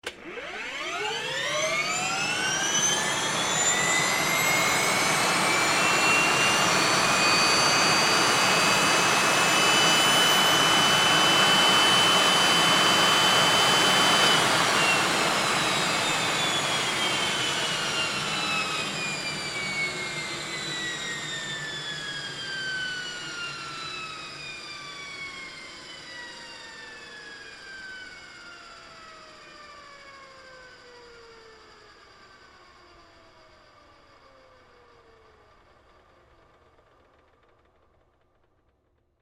Helicopter Run, Aircraft, Helicopter, Engine, Start, Up, Shut, Off, Rear, Perspective, Hh-65, Dolphin; Transportation; Helicopter Sound Effects; BlastWave